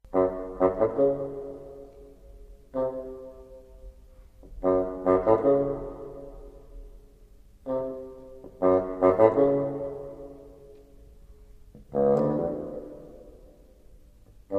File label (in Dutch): fagot